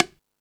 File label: Latin Layers, Drum Machines E-MU Pro-Cussion